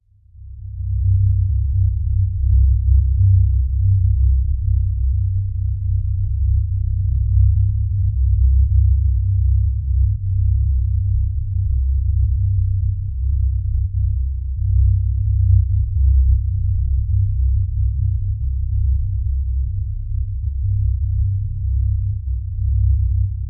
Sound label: a brooding cinematic dark; dark ambient